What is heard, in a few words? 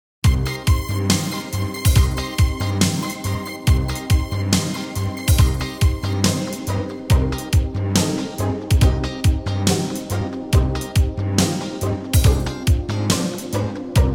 modern piano minus